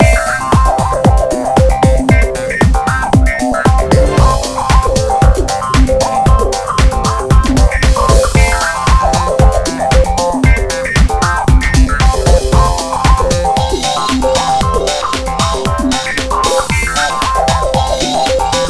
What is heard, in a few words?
music track